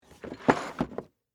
Game Box Down, Sports, Board, Game, Box, Set, Down; Board Games